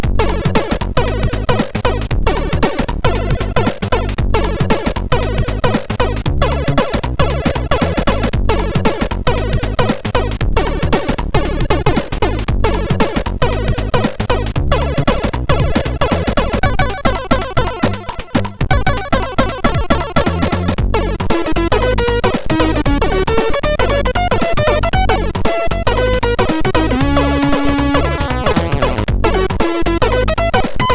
Camel Funk, MOS6581R2

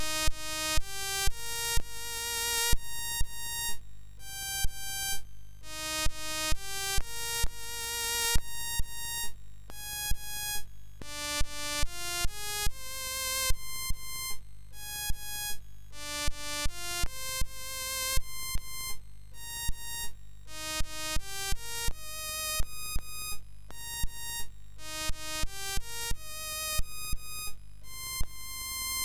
Strauss, MOS6581; hvsc

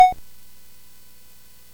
sid CSG8580R5; Lazarian

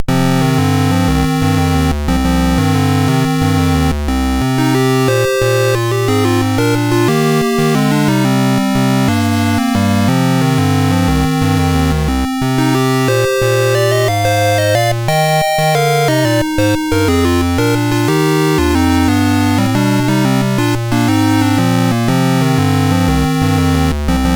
sid MOS6581R2; Treasure Island

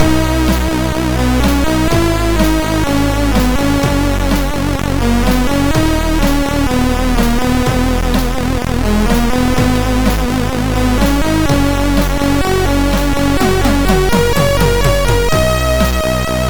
loader sid MOS6581R4

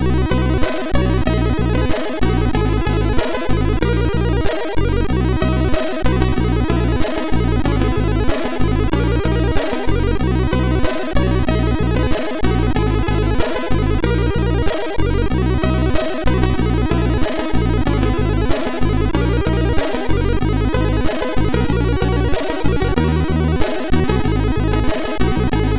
For Gold Rush, MOS6581R2; sid

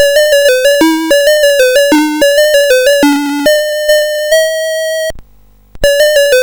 BASIC, CSG8580R5

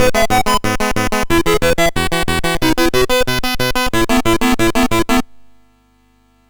Moon Hop, sid, MOS6581R3

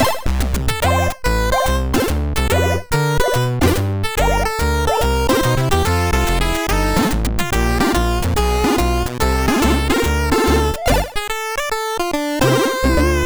Theme, MOS6581R3; sid

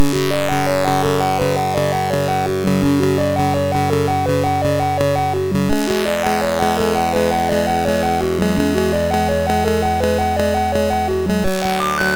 Mini Melodies, SID MOS6581R3

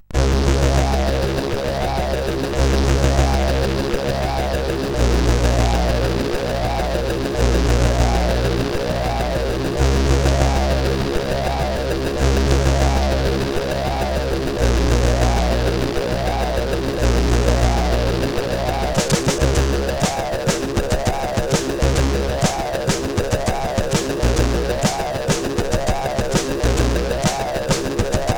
Heloport, CSG8580R5

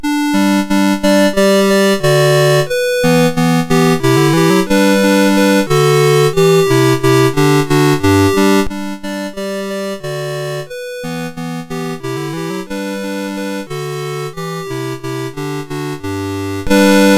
All ye Who Music Love, MOS6581

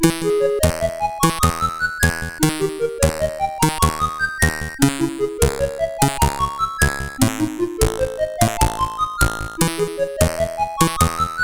Looping, sid CSG8580R5

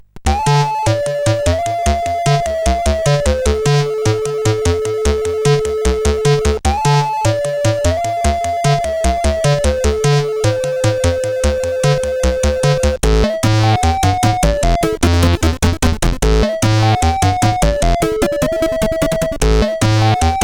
Heatbeat, CSG8580R5; sid

